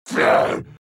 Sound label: Monster Yell